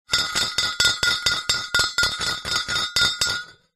wood thin pole circular movement inside metal tube close up ring; foley